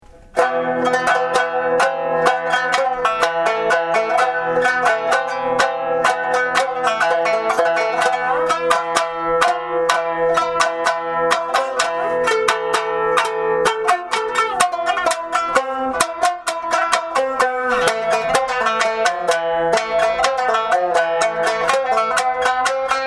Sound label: shamisen